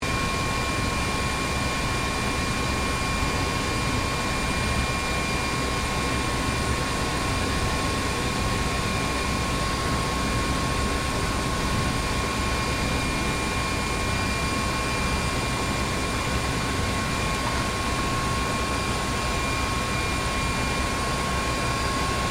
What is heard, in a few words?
Helicopter Off, Aircraft, Helicopter, Shut, Off, Hh-65, Dolphin; Transportation; Interior, Perspective, Crew, Movement; Helicopter Sound Effects